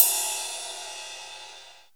RIDE, CYMBALS; DRUMS; cubase

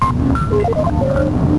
WIN

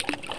SPLASH

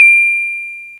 HIGH PI; BEAT2000

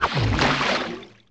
KNIFE

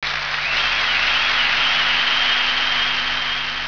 APPLAUS

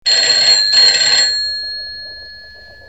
PHONE; SOUND FX; cubase

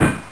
IMPACT; FRAGILE

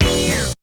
STOP SHOT; BEAT2000

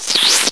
BIG SLURP

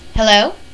FEMALE H; PEOPLE

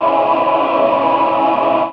cold vox; symphoniepro; 16bitsamples

